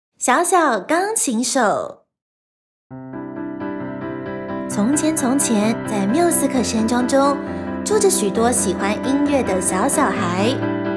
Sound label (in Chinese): 小小鋼琴手 音樂故事